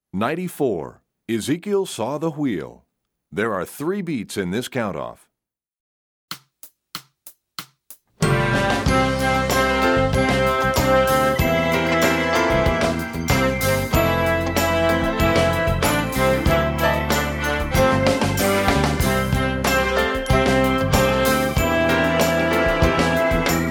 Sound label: Ezekiel Saw, Brass WW; EE2000 Book1